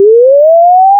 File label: create a short rising